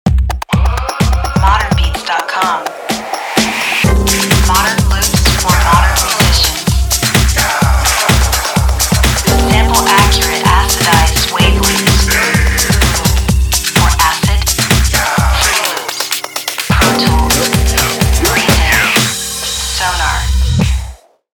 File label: RnB Klub Drum Loops